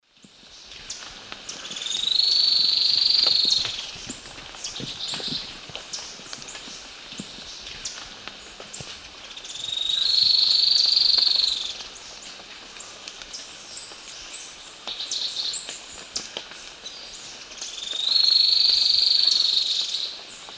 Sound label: Xenops milleri, song; S. America; Eastern Lowlands